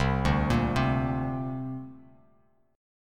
C 7th Suspended 2nd, C, down; C7sus2 arpeggiated; JGuitar

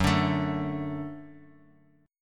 Gb Minor Sharp 5th, Guitar, Open F; strummed; x,1,2,4,x,x; JGuitar

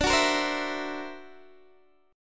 D Augmented 9th down Guitar Standard; D+9 strummed; JGuitar

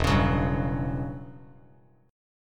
E Minor 6th Add 9th E down Bass Standard; Em6add9 strummed; JGuitar

